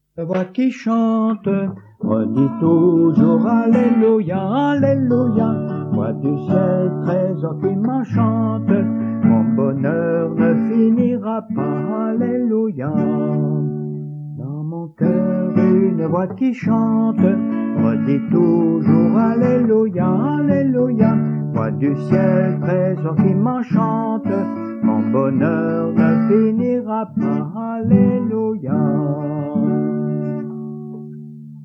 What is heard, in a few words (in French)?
dans mon coeur une voix qui chante